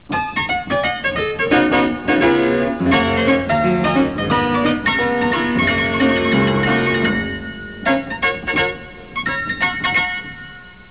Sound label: instrmntl piano